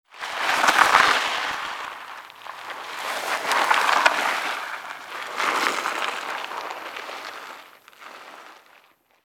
Snake slithering movement rocky terrain

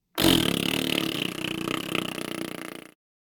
Human funny raspberry

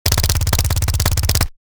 Thompson submachine gun suppressed burst; war

